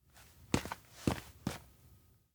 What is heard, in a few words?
steps indoor steps walk; rikka; anemoi パッケージ版 1.1